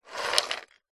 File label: household jewelry in wooden box tip slide lid open; zapsplat